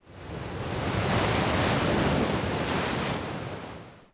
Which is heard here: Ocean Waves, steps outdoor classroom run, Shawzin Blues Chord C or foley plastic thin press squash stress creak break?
Ocean Waves